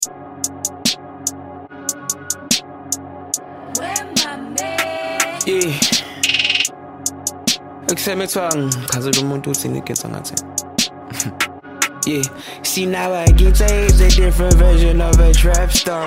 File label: Trap tar; Loki